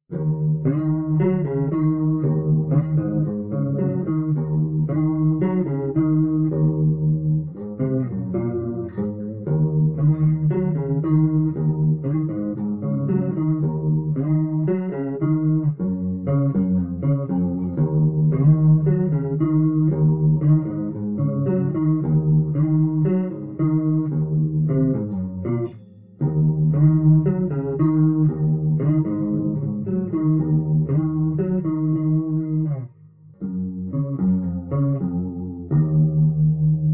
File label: calm